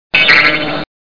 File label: blink, ringtones